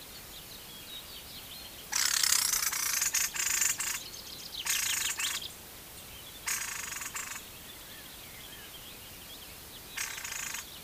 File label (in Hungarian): léprigó hangja